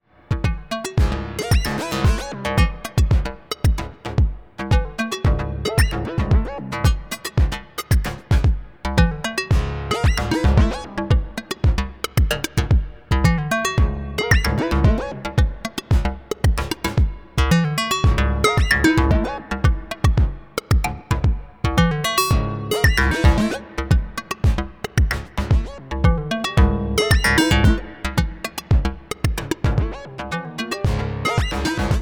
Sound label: Glide Collide, 32 Voice String Synth Eurorack